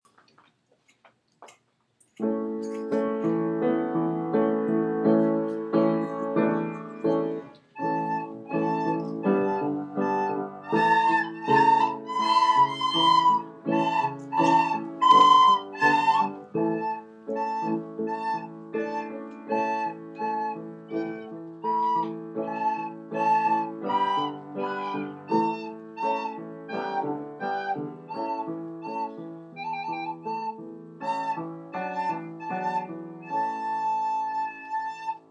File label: Composition with Piano